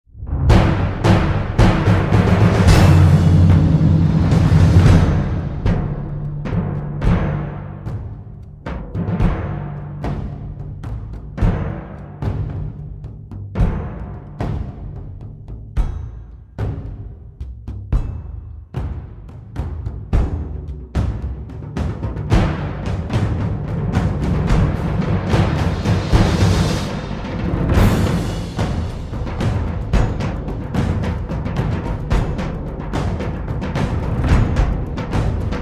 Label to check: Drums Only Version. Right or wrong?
Right